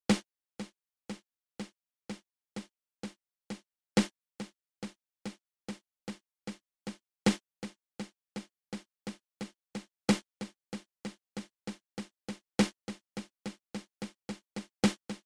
Triple Paradiddle open close open

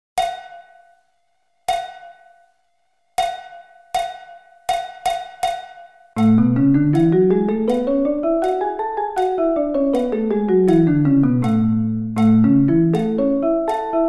A Scale

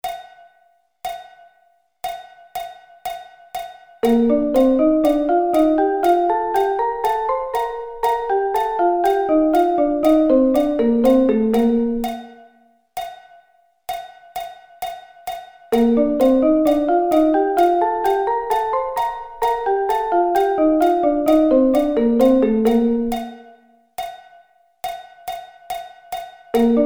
Bb Thirds

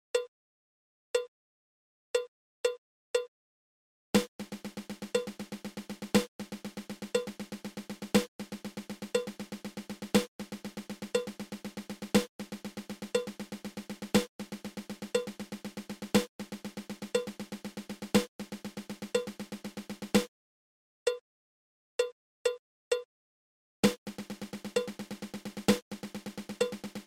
Fifteen Stroke Roll

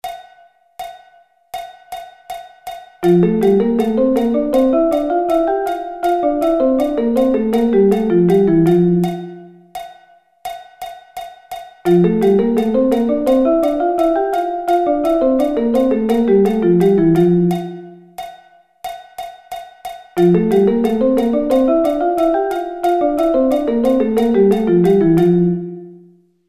Thirds

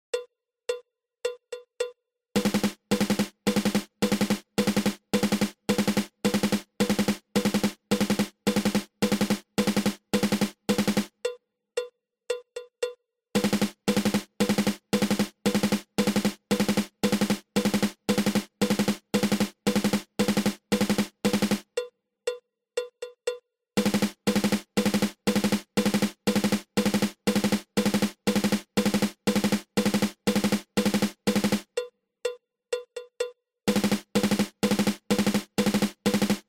Single Stroke 4

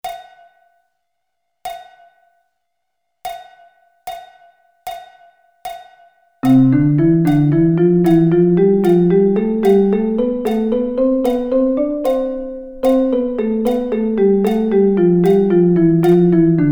C Double Sticking

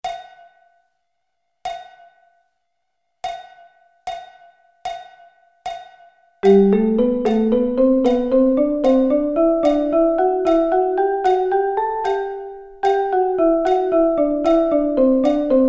Double Sticking

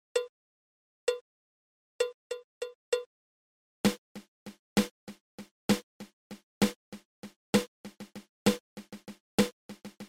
Single Drag Tap

